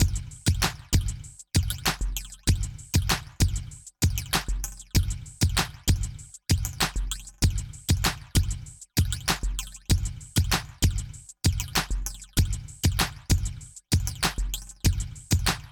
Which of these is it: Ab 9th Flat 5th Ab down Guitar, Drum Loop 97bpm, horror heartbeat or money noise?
Drum Loop 97bpm